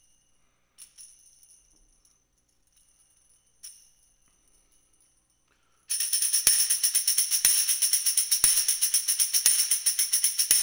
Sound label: Tamb